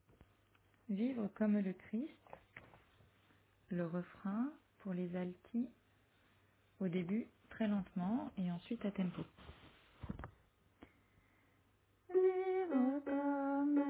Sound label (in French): Alto